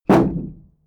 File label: shield drop wobble